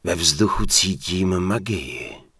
Human Male Cheer; wowpreklad; czwow; HumanVocalMale

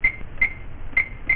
tink; hofclient

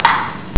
pingpong; hofclient

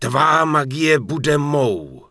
Human Male Cheer; wowpreklad; czwow; HumanVocalMale

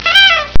toot; hofclient

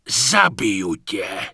Forest Troll Warcry; wowpreklad; czwow

